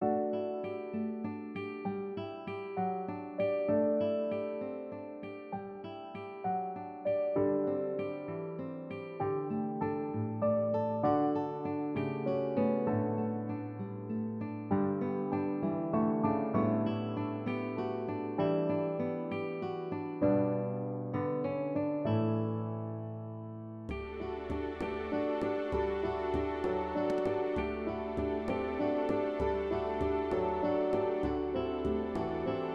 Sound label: calm bgm